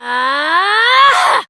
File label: Abil Exert Adult, en; Warframe; Duviri; Drifter; Melee